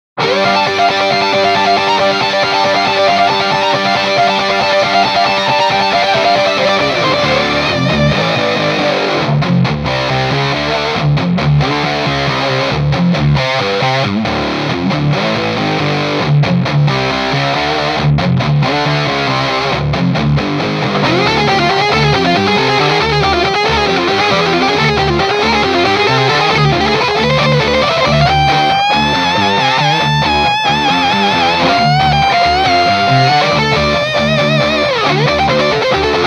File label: Coyote - Dirty Boy Buzzy Boy; mojave